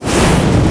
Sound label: fireball hit; lighthouse pluton; lighthousemonster